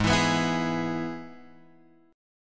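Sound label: Ab Minor Sharp 5th chord, Guitar, Drop D tuning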